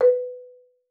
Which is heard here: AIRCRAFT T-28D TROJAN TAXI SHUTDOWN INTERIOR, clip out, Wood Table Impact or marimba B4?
marimba B4